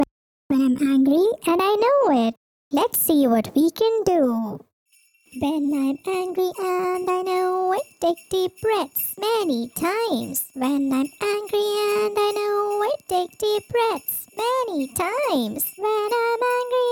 calming sing along song for kids to manage anger angry song